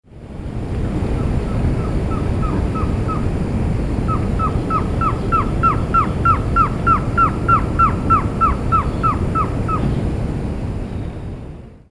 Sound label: Trogon surrucura; PE Cantareira SP; A Silveira